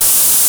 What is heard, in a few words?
PERC FX